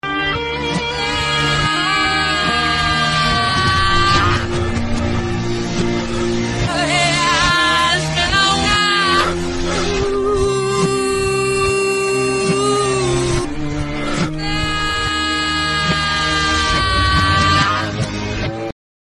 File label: aah, reverse